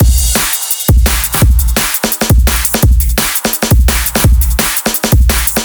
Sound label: Tight Rooms B, 170 bpm